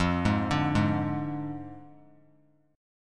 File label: F Minor 6th F down; Fm6; arpeggiated; JGuitar